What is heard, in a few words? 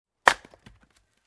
Wooden clicky knock